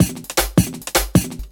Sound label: Beat, Futurist Drum & Bass; Drums & Percussion